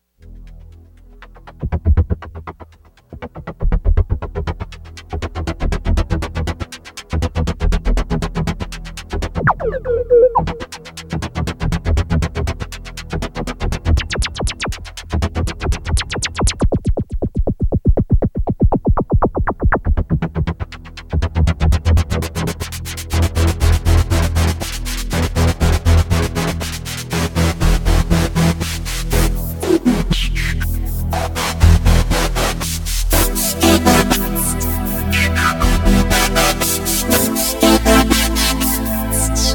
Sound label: Oasys Filter rhythm